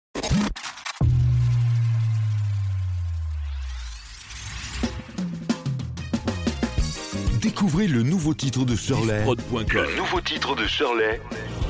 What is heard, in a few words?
Power Intro; First Reunion